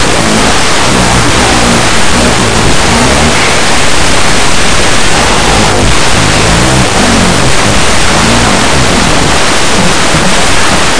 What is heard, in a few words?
club